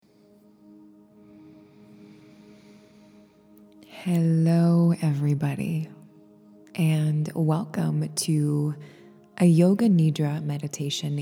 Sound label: waves of sleepy breath